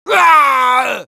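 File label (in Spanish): Sniper pain critical death, es; *Grito de muerte*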